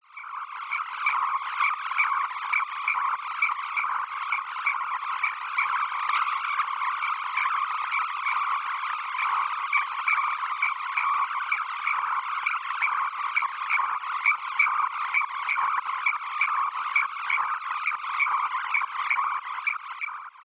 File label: digital pulse 7mhz sines; hprModel